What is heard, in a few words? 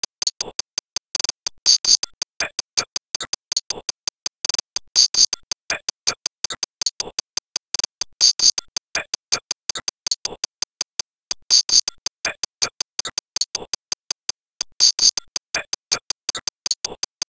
tick